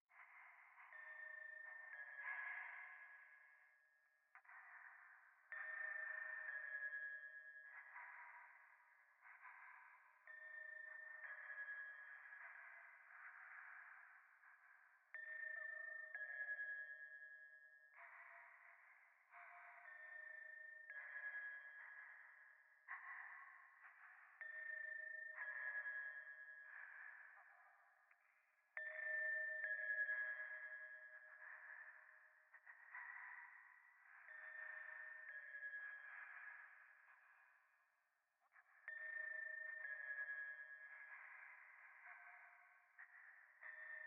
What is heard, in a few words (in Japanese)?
左耳快感吐息 神社